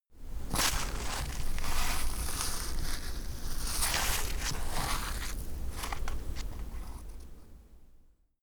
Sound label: clothing against branches; foerster